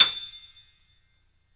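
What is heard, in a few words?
piano, Grand piano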